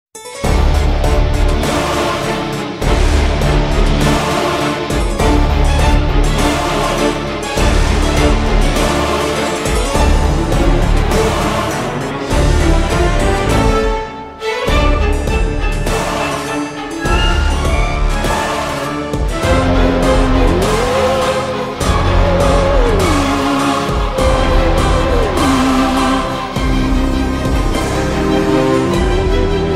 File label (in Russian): titry v konce; wednesday